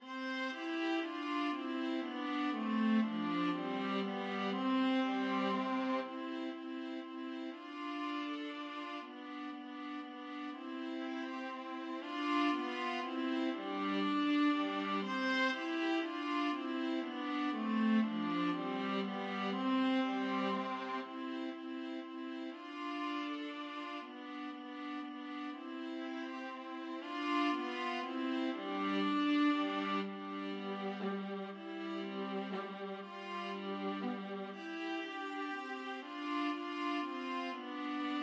Menuett, viola duet

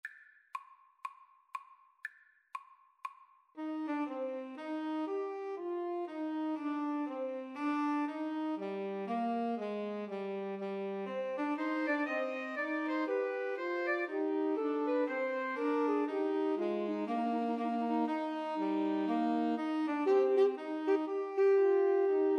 bach bouree, 2 Altos Tenor Sax

